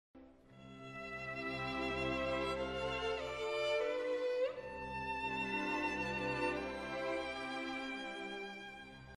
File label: Violin Concerto; Mendelssohn